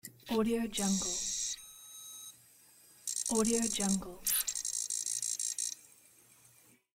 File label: cd read out